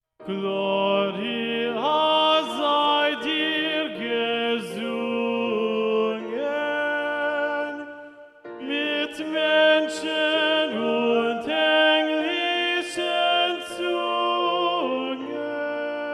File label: SATB Tenor Predominant; Cantata 140 - Bach; Gloria sei dir gesungen (Wachet auf, BWV 140); J.S. Bach